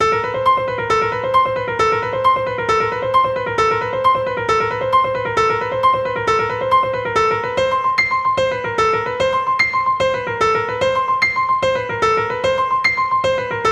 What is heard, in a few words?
Thumb-Under-4th-Finger Exercise with a Twist